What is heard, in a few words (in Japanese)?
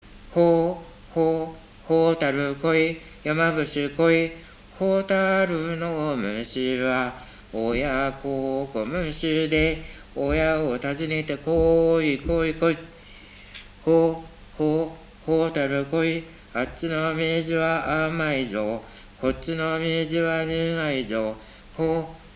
warabeuta